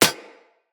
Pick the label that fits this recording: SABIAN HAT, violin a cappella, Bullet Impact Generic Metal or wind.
Bullet Impact Generic Metal